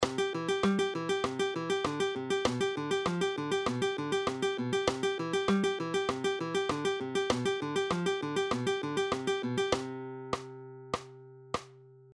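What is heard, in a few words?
Alternating bass Fingerpicking, Guitar Plucking; Matteo Carcassi; fingerstyle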